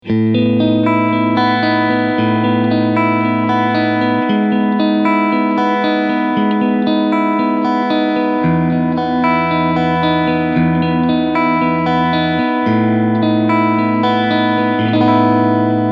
Clean EQ 3 Uhr

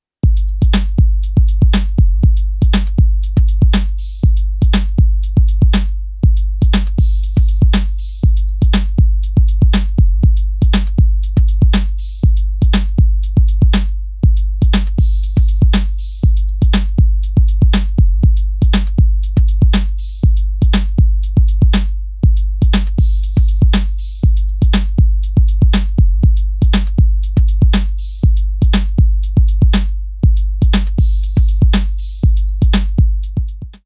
Elektron Sequenzer 1 Takt Pattern

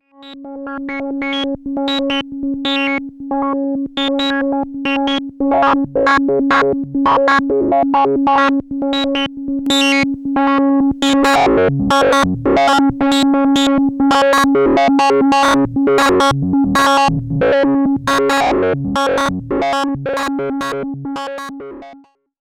Blubber 3003

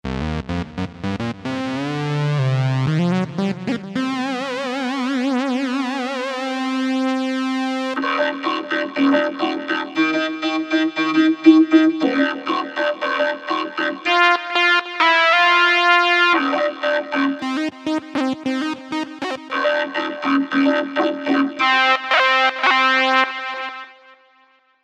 Rode RODECaster Pro II Solo Synth plus Pad Effekte

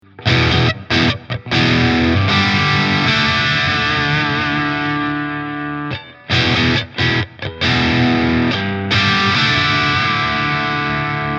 Steg verzerrt Bass Control; GL Tribute Doheny Shell Pink

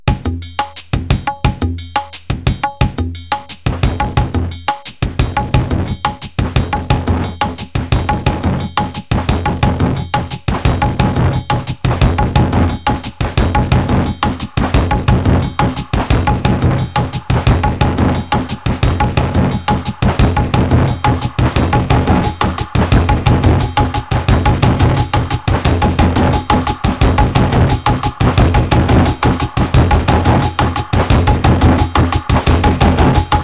Casio Groove trocken dann SRR Hall Delay; FieldKitFX

